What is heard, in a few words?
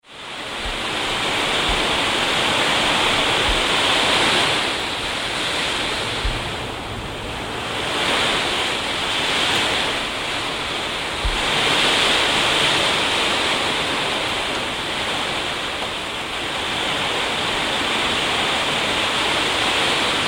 Rain Very Heavy Gusts Blown onto Window Glass; Interior recording; Rain Sound Effects